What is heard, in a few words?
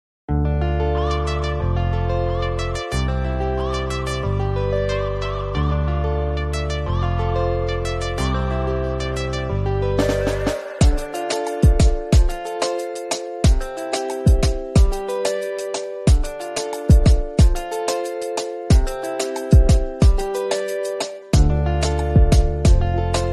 Beats BONGO